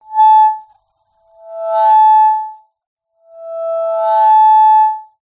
technology walkie talkie radio feedback

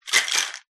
Ice Shake

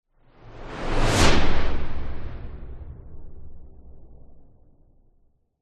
science fiction space fighter fly past fast

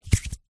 human hand dry fingers movement; zapsplat

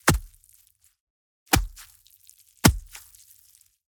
Gore Stab Punch Hack; Just Gore 2; soundbits

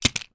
toy plastic tractor drop on carpeted floor

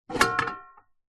rice steamer lid remove; household

